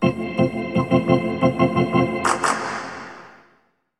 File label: Hockey Organ